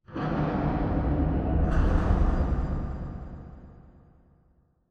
Robot Arm Tilt Down Dist; Boomtown; Titanfall 2

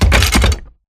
Metal Jog; Titanfall 2

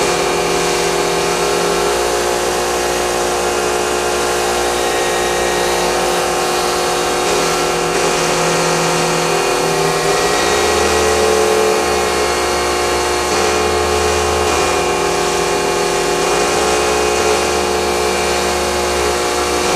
Above Dome Static; Boomtown; Titanfall 2

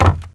Mvmt FS Jog Wood Layer; AI Stalker; Titanfall 2

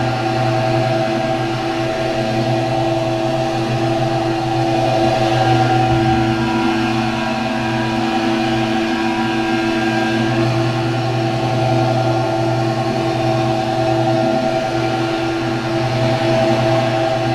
Assembly Conveyor Move Close; Boomtown; Titanfall 2

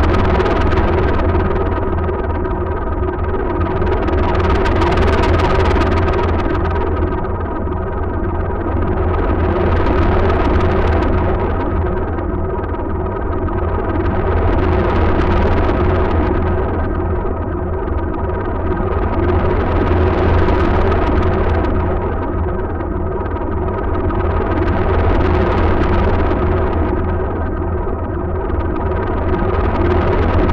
giant fan scifi distort; Titanfall 2

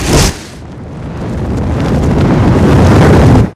Dish Free Fall; Beacon; Player; Titanfall 2

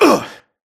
grunt hits; Titanfall 2

